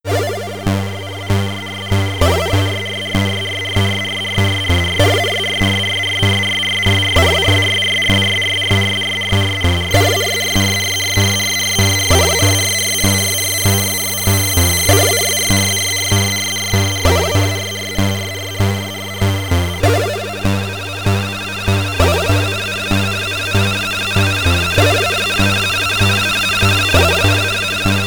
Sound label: BGM SID Stereo, commodore 64; Dynamic Duo